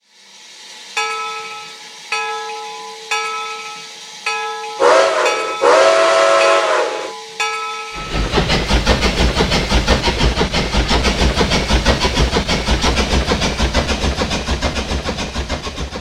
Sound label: Shay; RailPro